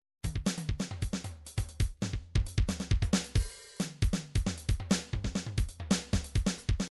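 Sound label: trance construction kit midi loops beats beatbox; newtronic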